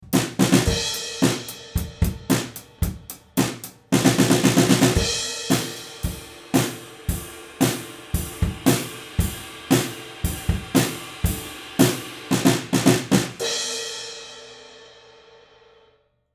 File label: Neumann KM83 Drums Front Of Kit